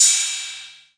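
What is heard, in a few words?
808 cym, cymbal, drum machines; 808 Extended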